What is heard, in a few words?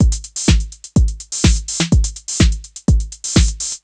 drum noclap full 125, Drum Loops, Classic Chicago House